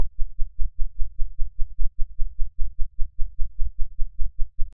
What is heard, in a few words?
burst 32ms 1kHz; Hilbert; Mic2 guida; CESI; LaSpezia